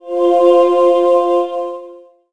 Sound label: Green Heart; the princess and the frog; Bayou; Draw Leaflight